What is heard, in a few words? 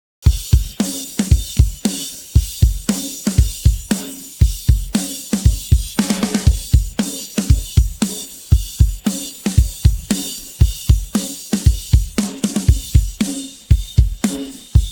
drums pattern